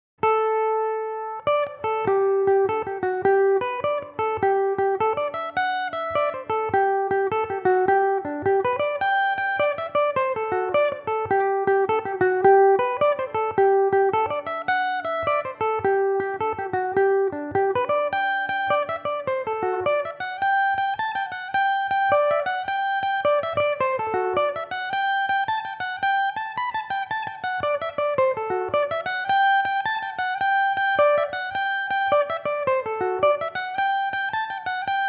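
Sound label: jig G major belmuse, irish mandolin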